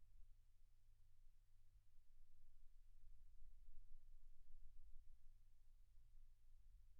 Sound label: silence 48kHz